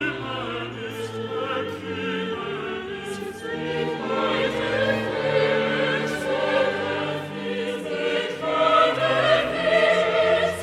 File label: ich hatte viel bekuemmernis bwv 21 coro